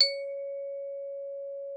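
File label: xylophone C#5; Xsample VOL-4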